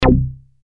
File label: Strings hit